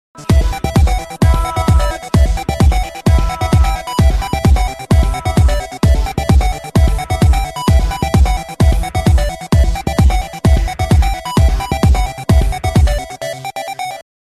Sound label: Techno Club Mix